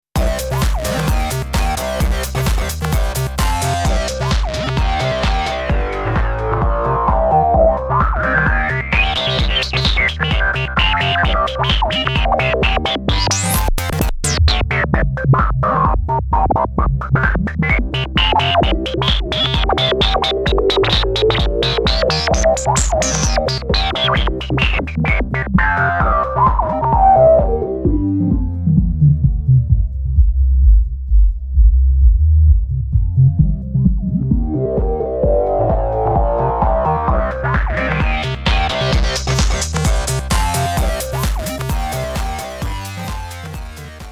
Restyler Lowpass

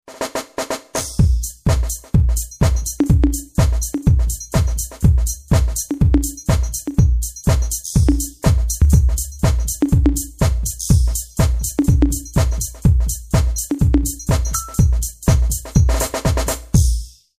Techno Set

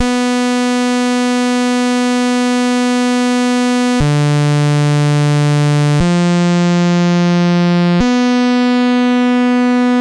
Sine Mod Sweep; WAVES Element 2

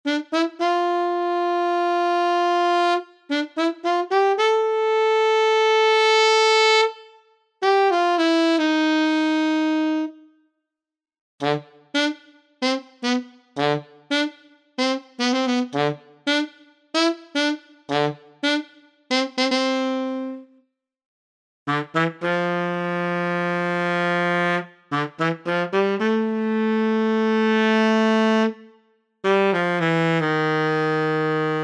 saxophone; swan

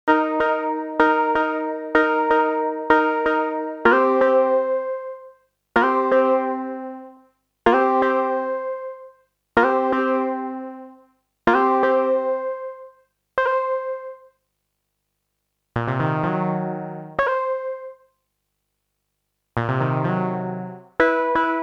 Oldskool Funklines

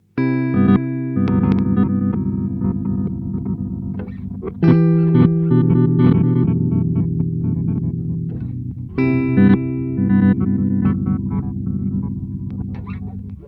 Chase Bliss Blooper

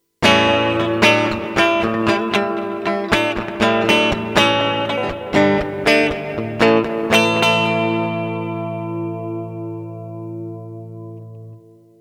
Reverb Huge; EarthQuaker Devices Ghost Echo V3 Reverb LTD

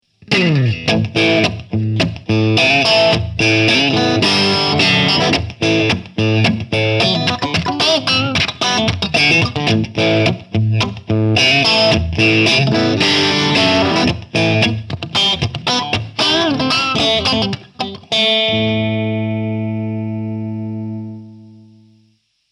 Hughes Kettner StompMan Crunch

